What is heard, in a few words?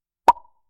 Single Bubble Pop